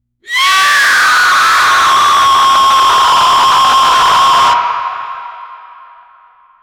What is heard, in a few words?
dark banshee scream